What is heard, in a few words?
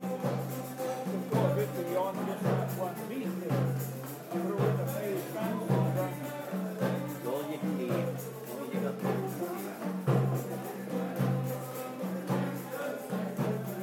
playing the drums